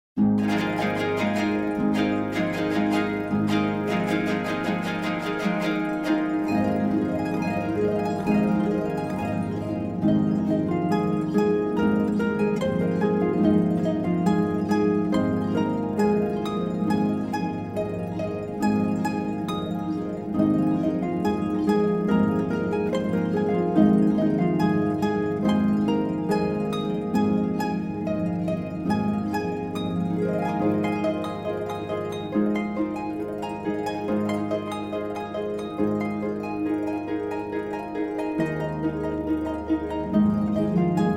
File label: Instrumental Harp Praise; We have met to worship-How firm a fountain